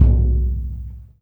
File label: LOUD DRM, BURUNDI; Spectrasonics; Heart of Africa